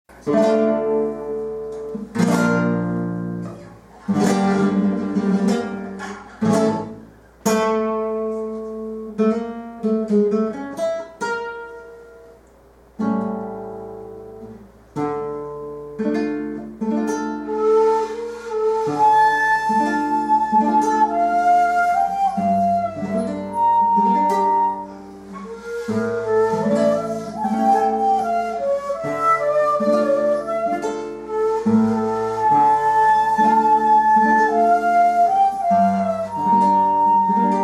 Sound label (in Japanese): 尺八, ジプシー音楽; 尺八でも無理をすれば速い曲も吹けるという例でジプシー音楽を演奏しました。; 小学校・音楽鑑賞コンサート; 貝塚市葛城小学校